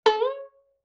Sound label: Violin Pluck Pitch Rise; Vintage Cartoon; Musical Elements